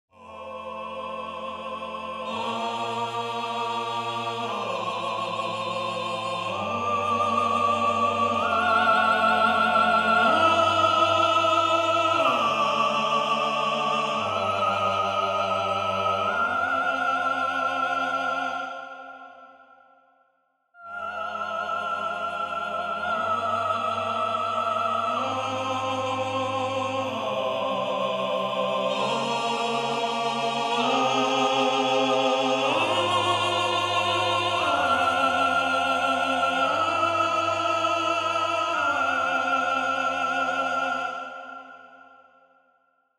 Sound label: counterpoint